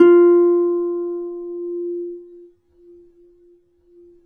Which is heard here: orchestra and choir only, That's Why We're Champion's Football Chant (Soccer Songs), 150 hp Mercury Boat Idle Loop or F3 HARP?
F3 HARP